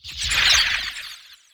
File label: Teleporter Nebula Cloud Enter; Evolve; Wraith